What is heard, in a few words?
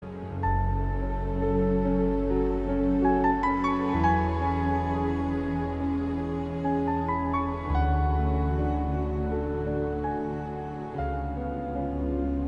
Prelude Ringtone